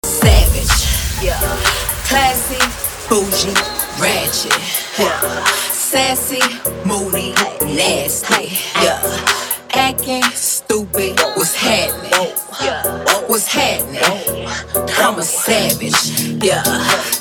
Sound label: Club Party Banger